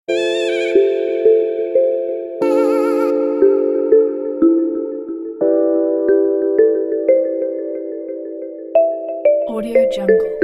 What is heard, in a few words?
bright chill